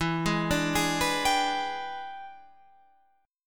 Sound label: E 7th Sharp 9th, Guitar, Open F; E7#9; arpeggiated